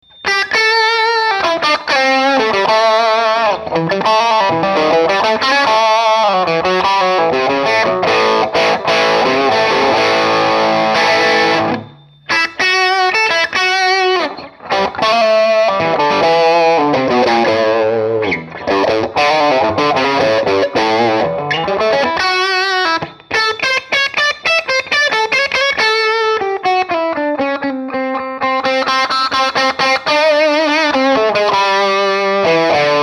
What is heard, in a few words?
wide range bridge dirty; lollar